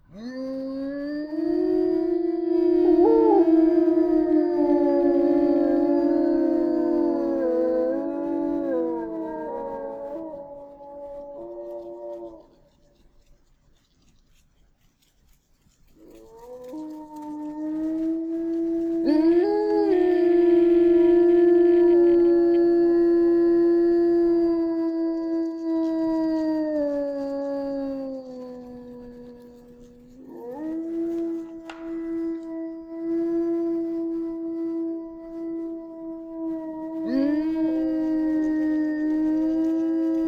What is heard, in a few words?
distant wolf howls; sound effects and sound reinforcement; The Passion of Dracula